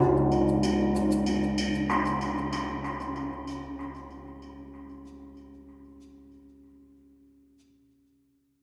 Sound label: Cave PERC